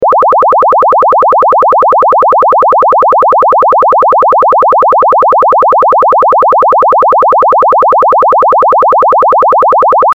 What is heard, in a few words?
chirp; chirp toys